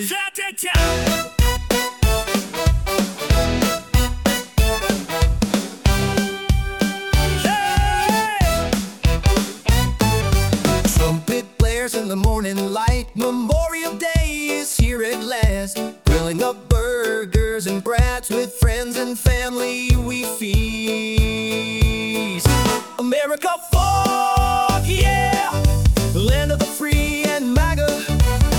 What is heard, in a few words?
Upbeat Arena Rock